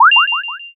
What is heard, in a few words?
unlock